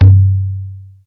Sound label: conga lo, Yamaha TG-500